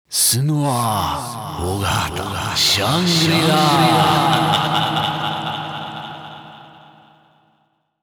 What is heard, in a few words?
red Panda Freespin Intro Chant; netent